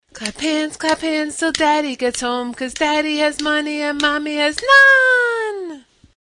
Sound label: clap hands